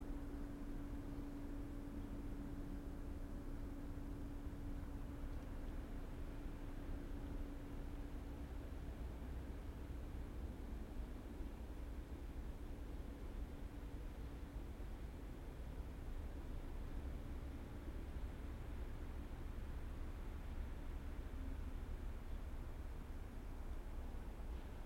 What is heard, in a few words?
yamanakako sound